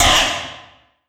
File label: Trap Oneshot; Sonic Foundry (Sony Creative Software); Esoterik Beatz